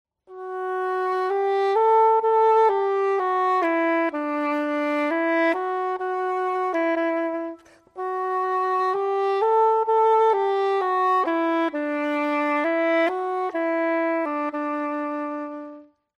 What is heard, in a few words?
english horn